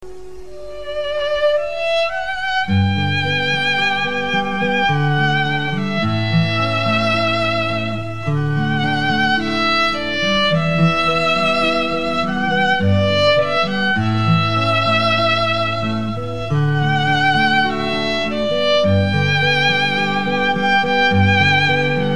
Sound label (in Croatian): violina